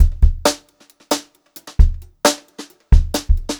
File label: Brushed Drum Loops; USB Soundscan vol.39; AKAI; 133 HOTROD